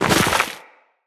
Walk Deep Snow, Footsteps; Operator

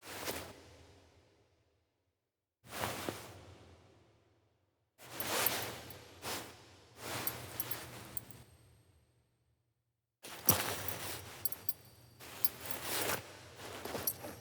Arena Wake Up Foley; Cinematics; Bromius; Bromius Quest Arena Wake Up

